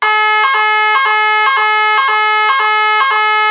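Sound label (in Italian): BITONALE, Sirena elettronica; AUTOSAT; lasonora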